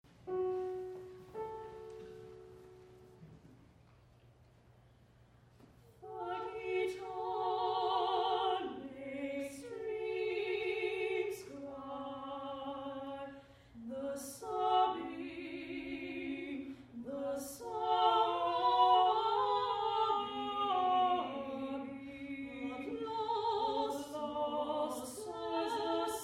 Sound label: Six Strings